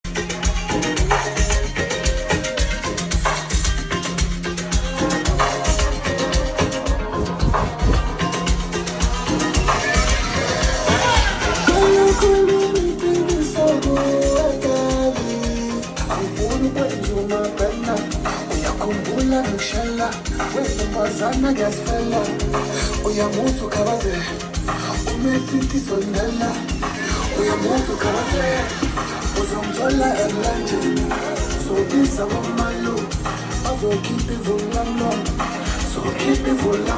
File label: Maskandi Piano